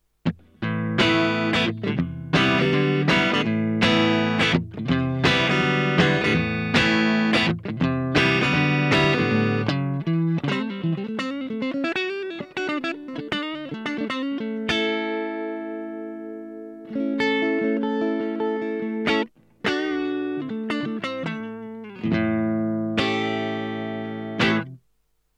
Blackstar LT Echo 15

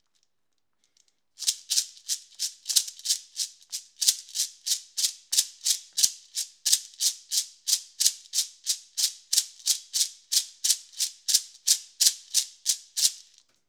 Shaker Raw

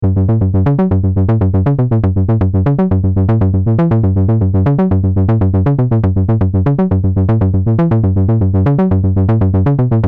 LPG Bass

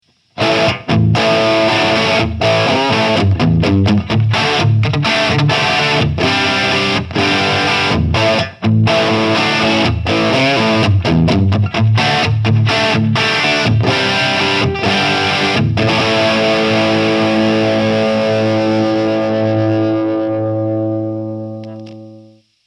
Hughes Kettner StompMan Humbucker With Sagging

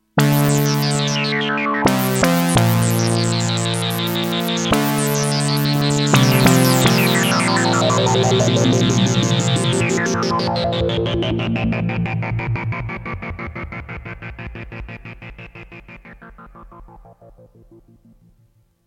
Lead Zipper

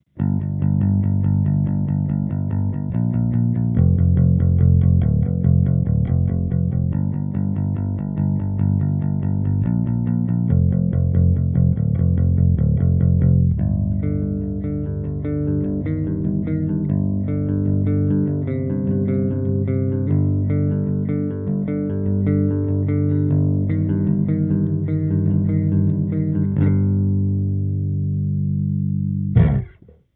Block 300B PJ Downtuned Cab

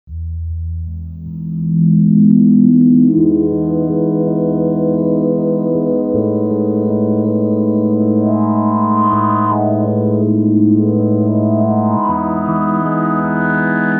MOOG

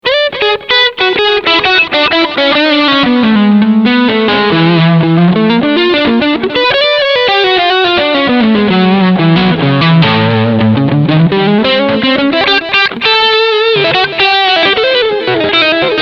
SURFING LEAD

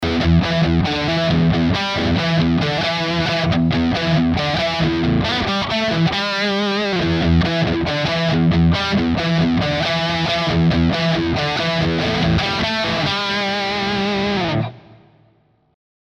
Neck and Bridge SC Distortion; Ibanez AZ226

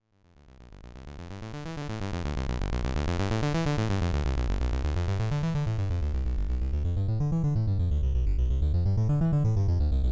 Sonicware ELZ 1 DNA Explorer Guitar

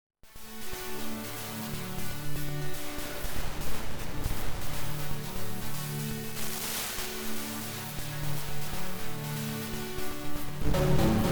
Preenfm3 FM Synth xor lp fold Reverb

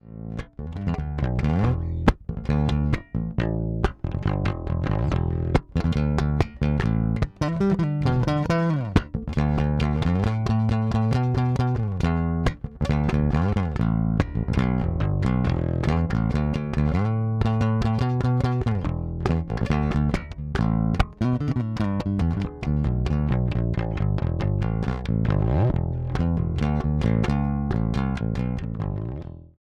Rock Sound

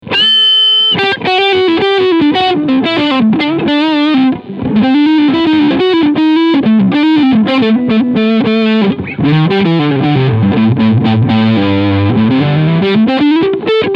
Leadsound Front PU

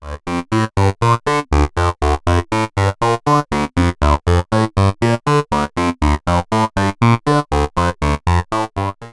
Formant Modulation; Waldorf Kyra

